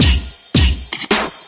162 beat bash break; 161 to 180 bpm

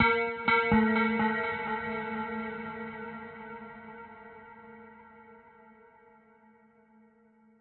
bell echoes, synth and electronic loops

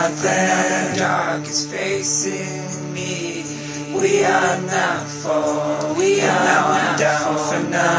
120 vocals, Folksy Voice; remixable collections; Folksy Guitar and Voice Stems

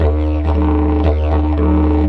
didg groove, didgeridoo; amani friend; WORLD

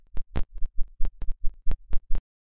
disturbing clicks; Various Strange Sounds and Effects

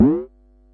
silly elec fx

